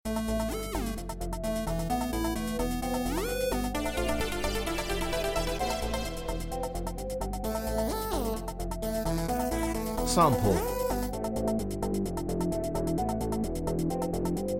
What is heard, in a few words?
disco